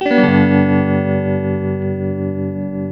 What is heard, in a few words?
TELTMUMAJ G#, TELE CHORDS, Guitars; Spectrasonics; Hans Zimmer Guitars VOL-1